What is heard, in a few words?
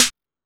Techno F, Drum Machines, EMU XL-7